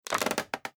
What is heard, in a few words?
floor creak; time-lab